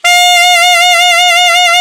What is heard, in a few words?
ALTSAX D3; Best Service; Alpine Volksmusik